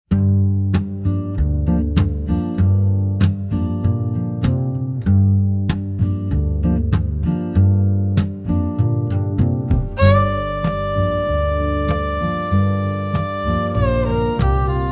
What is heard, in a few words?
Modern indie acoustic nostalgic